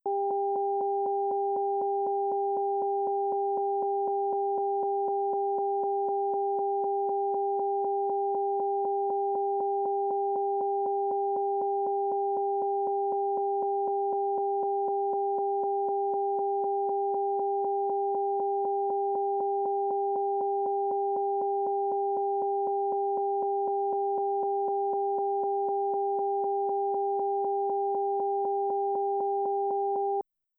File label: alternating bursts; linearaudio